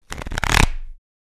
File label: Deck of playing cards shuffle